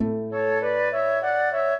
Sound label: minuet, flute harp; Mozart